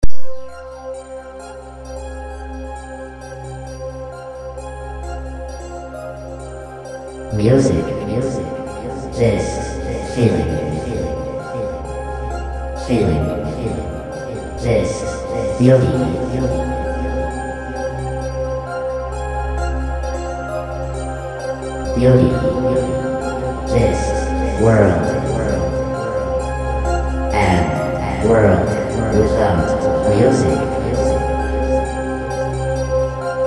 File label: Intro, Vocal Synth.